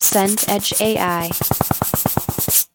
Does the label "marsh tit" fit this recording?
No